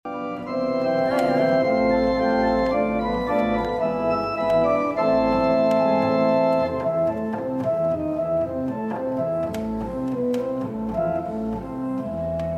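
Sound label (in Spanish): Sonidos órgano de la Catedral de La Habana Vieja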